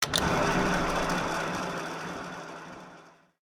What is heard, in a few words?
reels; Grand Tiger